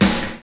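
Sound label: SD, snare drum; X-Static Goldmine 1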